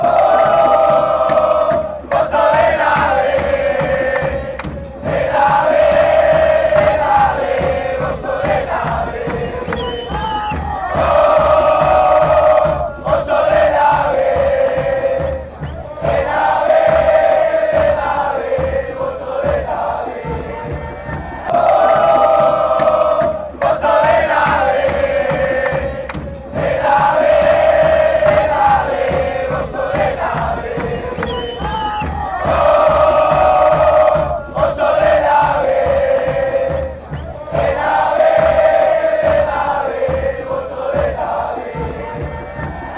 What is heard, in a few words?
patronato chant